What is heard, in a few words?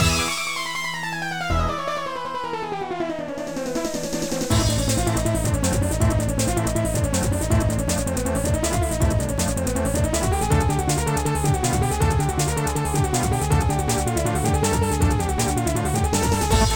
Bumblebee Techno; Looney Tunes Cartoon Conductor; Nintendo DS